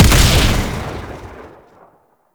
Antimaterial Projectile Explosion; Warframe; Entrati; Conquest

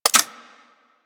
Sniper Rifle Zoom Out; Grineer; Gorg

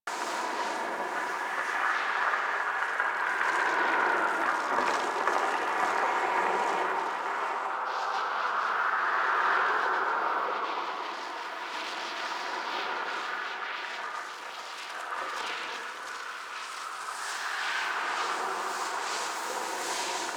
Transition Howl Wind Amb; Warframe; Corpus Gas City; Ambience

